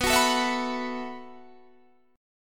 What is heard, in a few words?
E Suspended 2nd Suspended 4th B